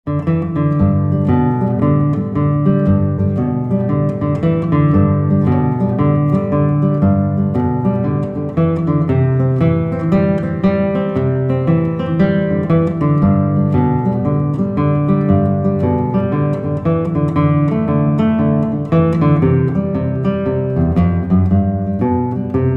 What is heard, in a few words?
Boogie Exercise, guitar; Play Guitar Powersteps 1